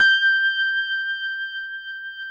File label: PIA MICRS, MICRO-PIANO